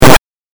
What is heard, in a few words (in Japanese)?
8bit ダメージ